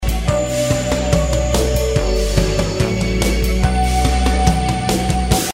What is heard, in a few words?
Groove, drums